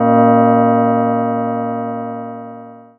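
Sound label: cloche, bell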